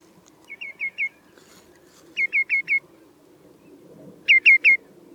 chevalier aboyeur

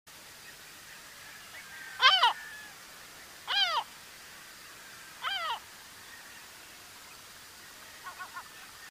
goeland leucophee

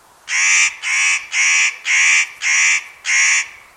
cassenoix mouchete

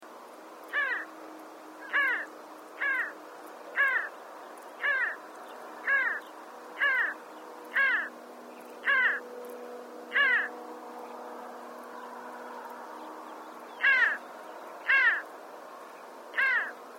ganga cata

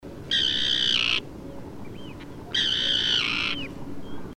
sterne pierregarin

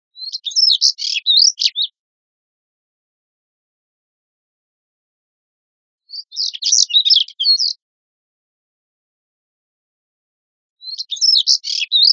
tarier d afrique, orn

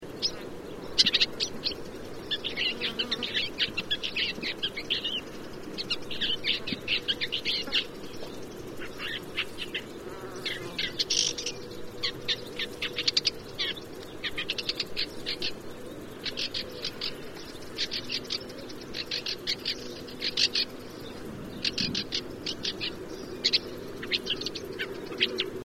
pie grieche a tete rousse

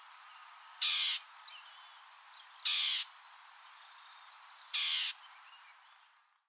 fauvette pitchou

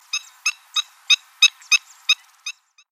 echasse blanche

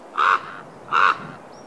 grand corbeau

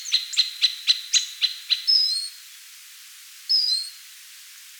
gros bec casse noyaux

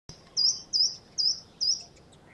grimpereau des jardins

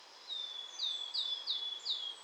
pouillot siffleur

